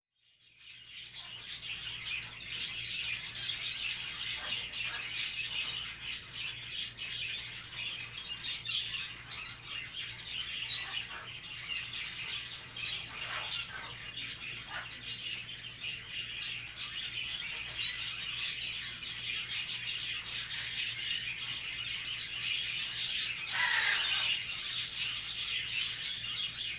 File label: Pet Store Interior W Birds Chirping And Bell Jingling In Background.